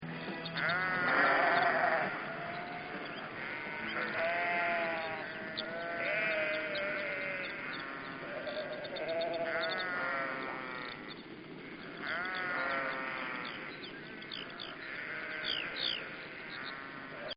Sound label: Sheep, Sheep Baaa; Animals; Miscellaneous Animal